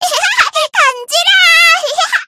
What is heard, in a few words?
laugh; spyro